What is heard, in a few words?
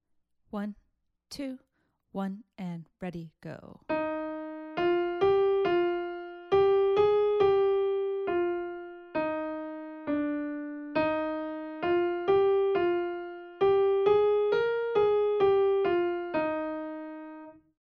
melodic dictation